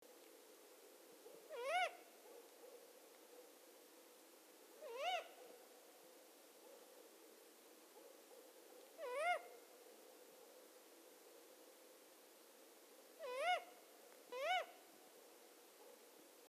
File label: warning call